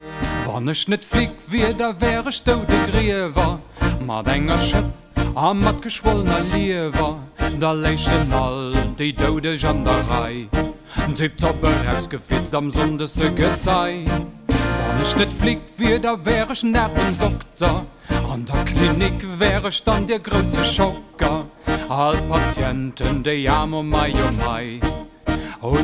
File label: tango